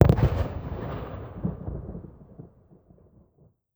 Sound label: city battle; War Ambience